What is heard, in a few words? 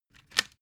cd cover close